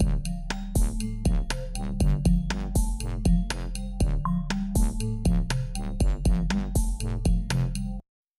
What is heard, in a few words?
Phased synth in context; 经过相位处理的打击乐合成器; Phasis; 经过相位处理的打击乐合成器既为节奏和贝斯留出了清晰空间，又完整保留了乐曲原有的氛围感